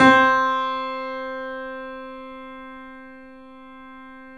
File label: 3D Pianos Yamaha C7; E-MU Producer Series Vol. 5